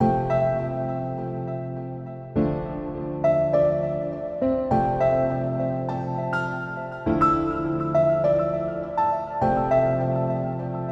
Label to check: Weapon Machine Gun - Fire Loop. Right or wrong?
Wrong